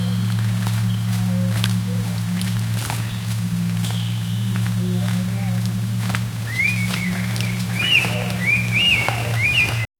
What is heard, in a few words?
distant bird calls rustli